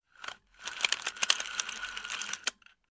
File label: Yarn Machine Gear ticks